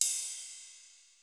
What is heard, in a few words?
ride, Roland MC-909